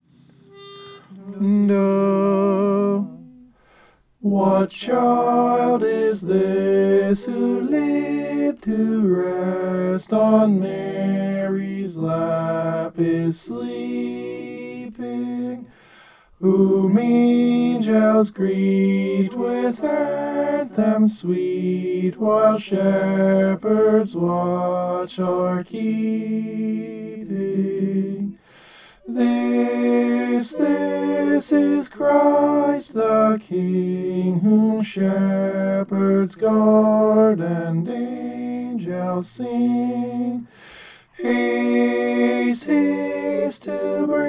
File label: tenor